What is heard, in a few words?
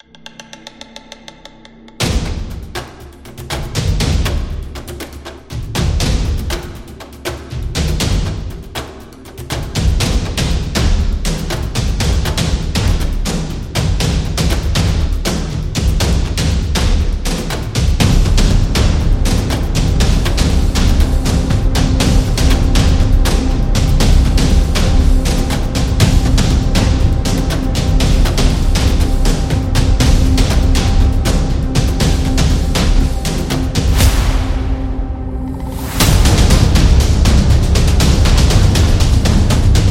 Tribal Drums Epic Action; battle drum